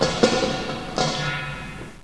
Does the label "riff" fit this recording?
No